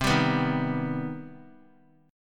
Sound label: C Minor Major 7th C 3C,3F,3A,3D; strummed; JGuitar